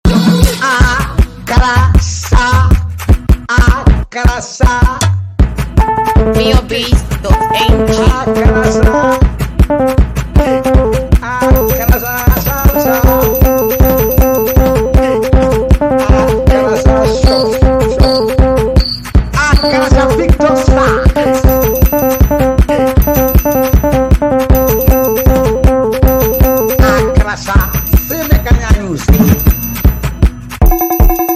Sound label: Beat